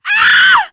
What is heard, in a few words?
death scream, teen girl